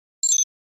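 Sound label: Flash Beep Electronic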